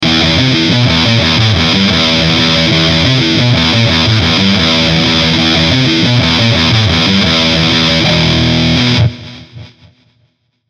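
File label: Mayones Setius Pro Neck, guitar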